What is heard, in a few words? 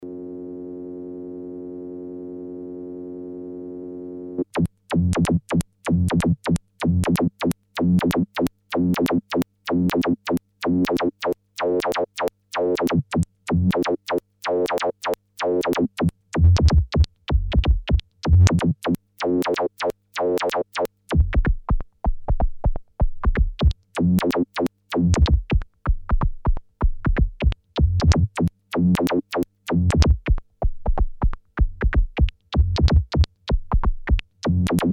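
Sonicsmith sq side chain vca vcf joystick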